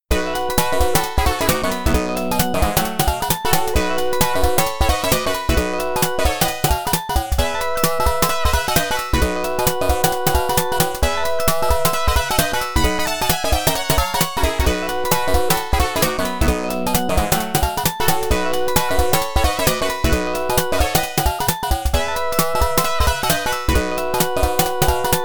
BGM (Fast); Block Block; arcade

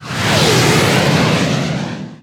Jetcar Passby; Nicktoons Nitro; arcade

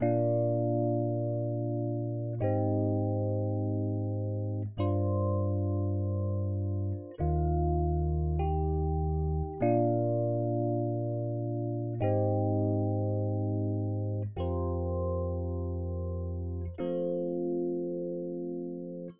rhodes; Toki Tori Collection

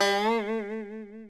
Stinger Bad; SpongeBob vs The Big One Beach Party Cook-Off; NDS

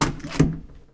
Door Open

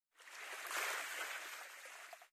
swim; out of reach treasure royale 2020 gamerip pc

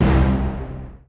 Combo Losing Hit; Bee Movie Game; Duel

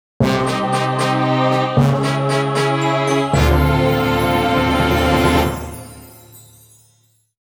Defeat Jingle; tokimeki memorial 4

